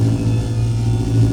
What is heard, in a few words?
neutron windup layer; Stellaris; apocalypse